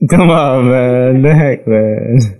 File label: ah man the heck man, laugh